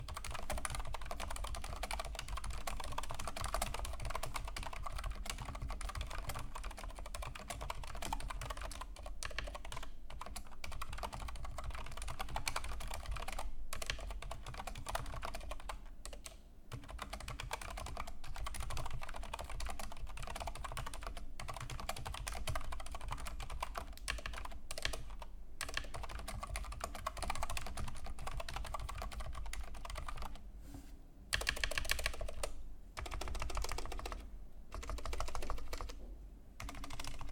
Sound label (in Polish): Razer Deathstalker V2 Pro brzmienie